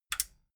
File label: metal button stereo click power on